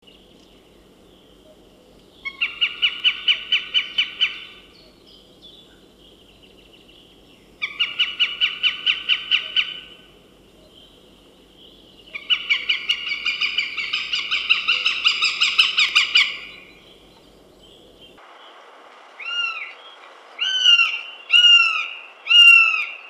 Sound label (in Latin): Accipiter gentilis; Голос; aves